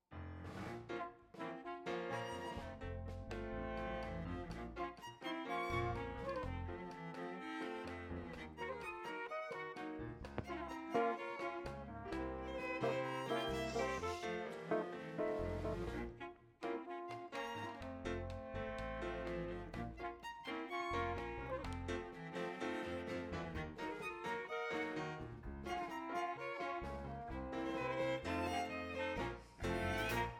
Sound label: Tango